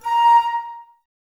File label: flute, Wood Winds, Flutes; Miroslav Vitous - Woodwind Brass Ensemble